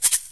rattle metal

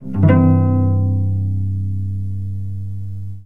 plucked chord, cello

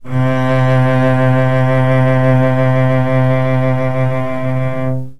low C, cello

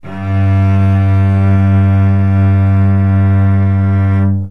octave chord, cello

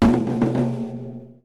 tom roll

